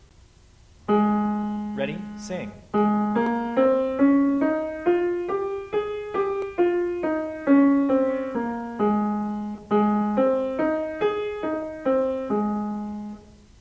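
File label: Ab Major, scales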